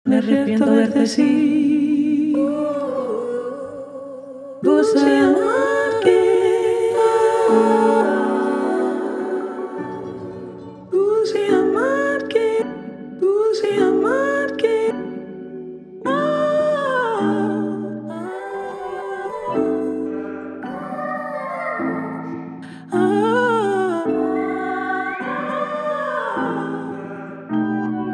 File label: 105BPM